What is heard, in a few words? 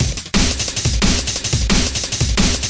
177 break, DnB Breaks; 141 to 185 bpm; ethex